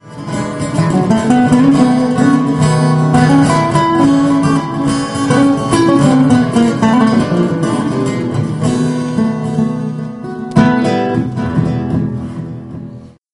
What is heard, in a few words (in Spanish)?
acusticos